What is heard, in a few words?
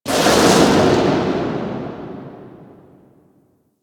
Electricity Blast; Ambience; Props; Warframe; NineteenNinetyNine